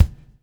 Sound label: BUBL, BUBBLE; Spectrasonics - Backbeat